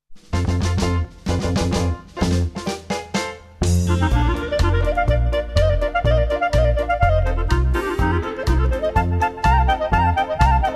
clarinet polka

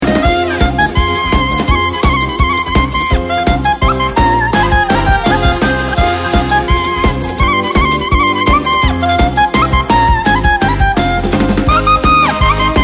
nigun simcha, instrumental

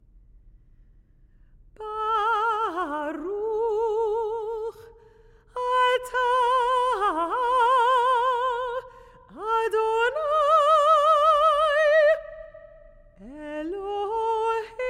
lishmoa kol shofar; cantorial; mahzor for young families; rosh hashanah yom kippur

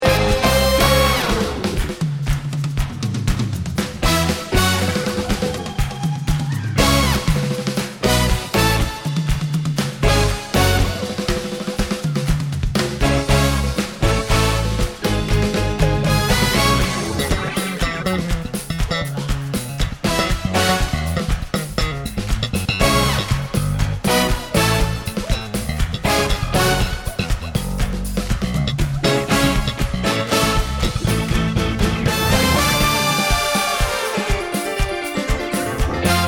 instrumental

